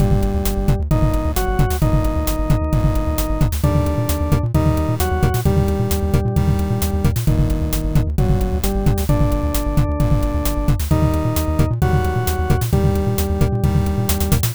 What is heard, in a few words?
bgm